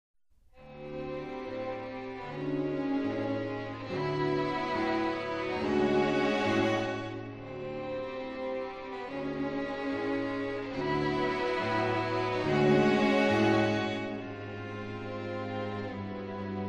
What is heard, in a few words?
ocarina